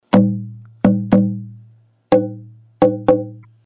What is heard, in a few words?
Mahogany Body